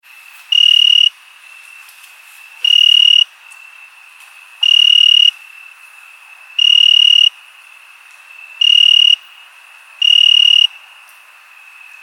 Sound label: Ringtone